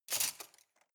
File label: cutlery large multiple drawer grab